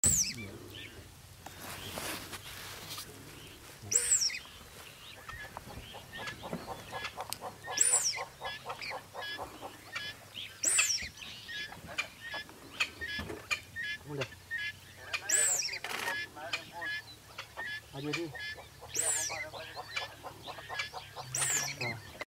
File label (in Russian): antilopa dikdik